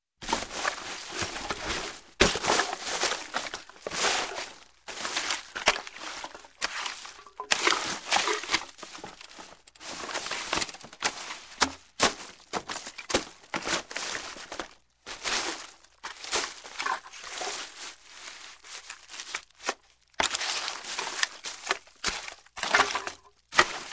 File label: Garbage Rustling Through Garbage, Rustle; Sound Ideas 2000 Series